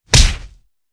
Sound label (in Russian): udara tysch dlja videomontazha